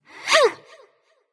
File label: grunt jump light; smite; voicepack; hel